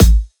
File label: kick one shot raw, drums; Pop; SD PASADENA Pop Sample Pack; Slate